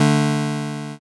power chord

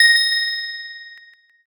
handbell

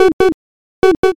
frog

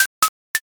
crack

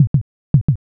heartbeat

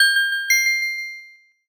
shop enter

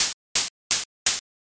leaf step

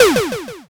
shot

